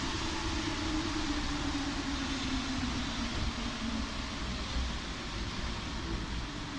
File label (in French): freinage, Metro